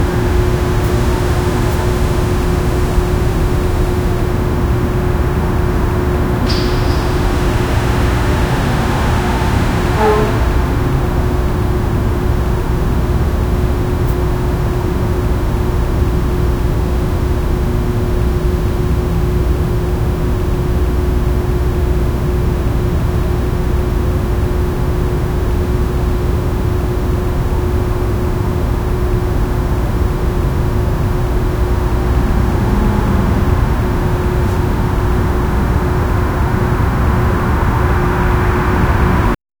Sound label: calm early morning city s